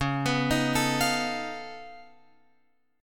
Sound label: C sharp Augmented 9th C sharp down Guitar Open F, chord; C#+9; arpeggiated; JGuitar